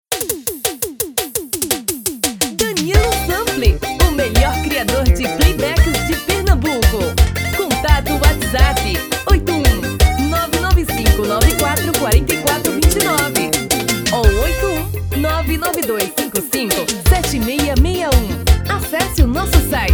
Piseiro

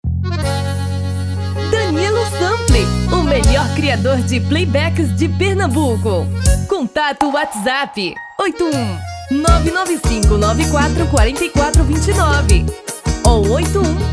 Vanerao

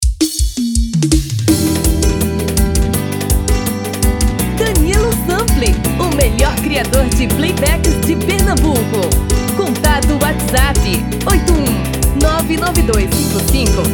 ELETRICO tom masculino

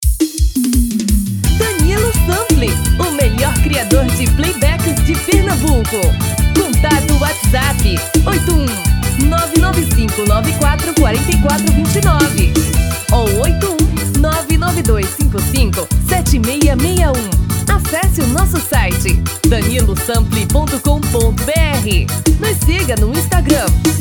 Arrasta pe

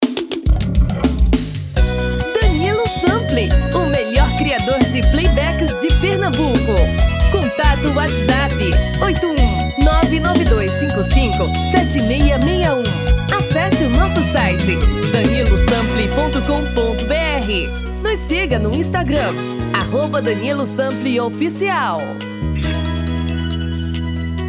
BREGA

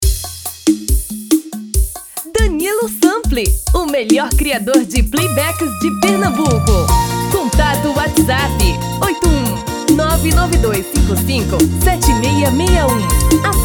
Brega

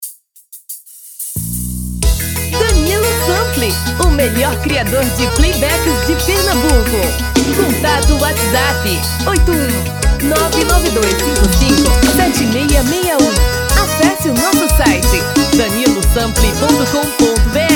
Vanerao

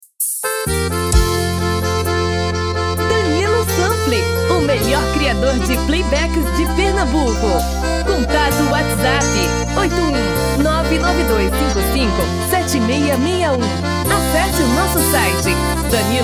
Tom feminino

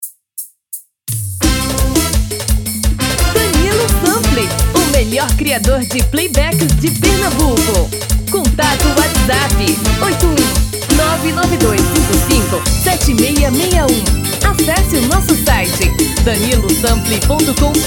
Caboclinho

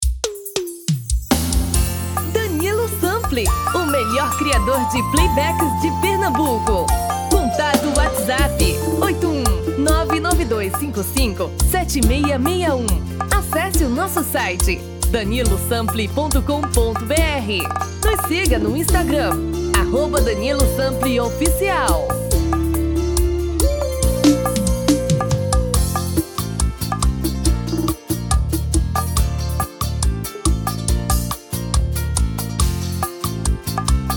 tom masculino

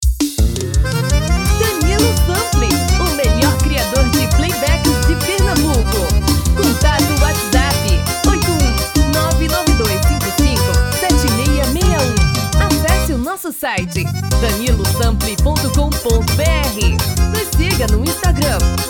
tom feminino

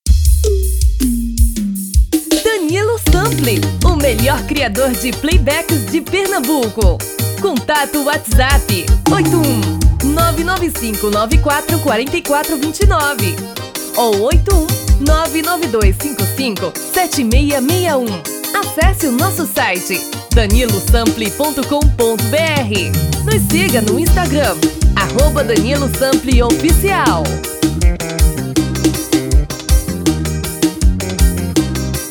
Vers Piseiro

